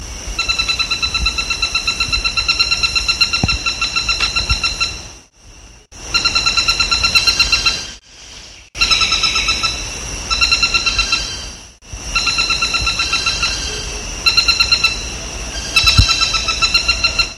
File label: flameback